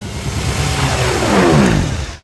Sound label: Whoosh jet stop; Ed Edd n Eddy; Adromulus Pack